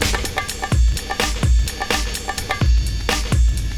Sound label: G sharp m - 102, percussion loops